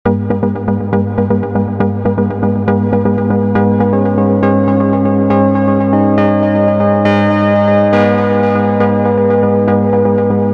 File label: Melodic and Progressive House, Repro-5; Melting Icicles; Basic Wavez Modulate Melodic and Progressive House Presets for Repro-5